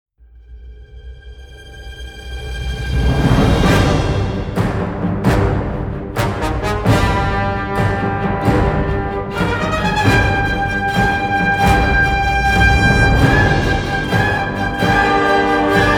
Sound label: Boss Level; Minora-Game; Sound-Artlist